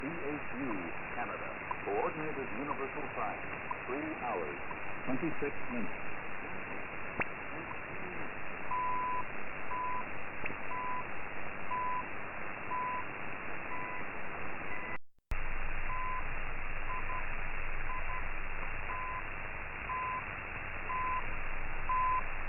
7850khz, USB, Zeitzeichen; Kanada; Zeitzeichen CHU

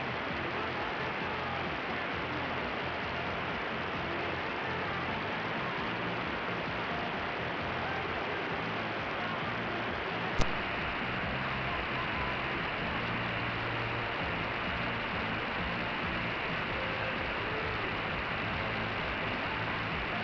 1251khz AM; Radio Nostalgia

